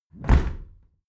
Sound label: window close heavy